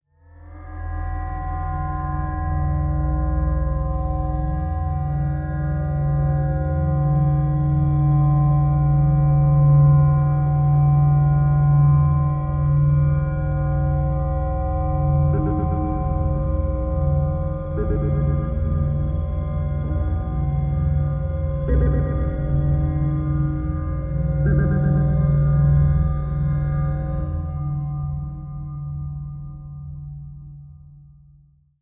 Low Passage Low Atonal, sci fi ambience

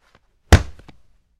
Football Catches

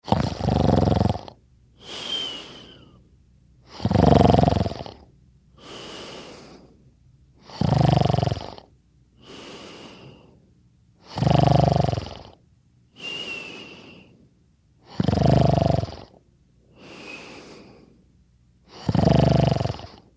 Female Snore

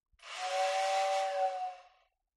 Toy Electric Train

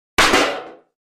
Aluminum Pan Impact; barrels buckets & cans

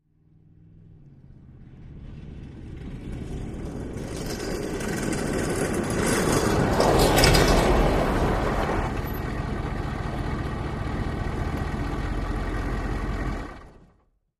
Humvee: Fast In, Idle And Off.

